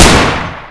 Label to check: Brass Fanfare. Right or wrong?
Wrong